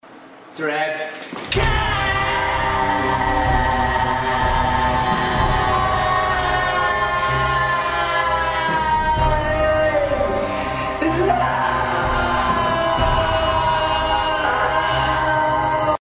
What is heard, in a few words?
trap cave